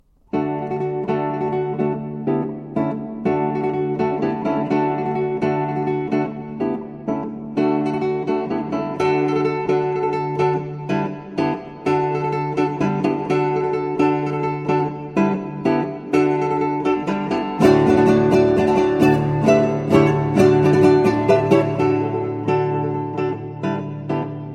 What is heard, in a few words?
classical guitar; INSTRUMENTOS MUSICAIS